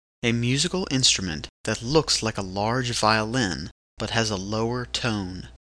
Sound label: cello